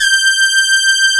BR G5, brass; AKAI S-Series CD-ROM Sound Library VOL-8

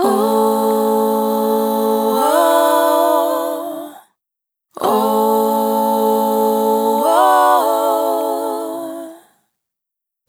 Dry, Multi-Voice Loops, Vocal Samples; Coincidence; Sonic Foundry (Sony Creative Software) - Pocket Diva World Class Vocal Samples

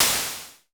SN Basic, Vermona Mono15 Drums Snare

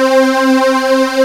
DIGI WAVE, DIGI PAD

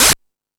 House Machine, Drum Machines

